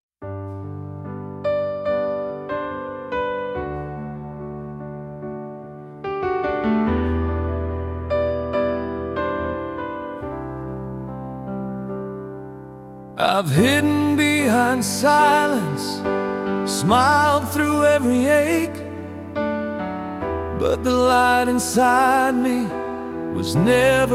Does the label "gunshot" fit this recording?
No